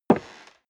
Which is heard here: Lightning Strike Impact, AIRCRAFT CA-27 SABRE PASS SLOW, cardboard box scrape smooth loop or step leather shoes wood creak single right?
step leather shoes wood creak single right